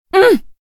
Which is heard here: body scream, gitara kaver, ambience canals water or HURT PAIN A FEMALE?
HURT PAIN A FEMALE